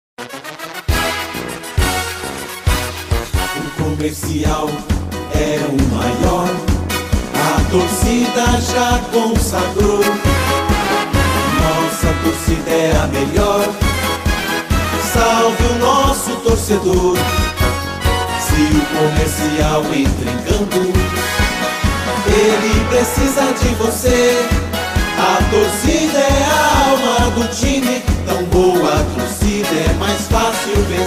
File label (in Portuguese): Hino do Comercial